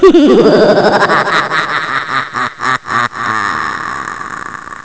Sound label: CACKLE